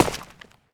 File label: dirt, footsteps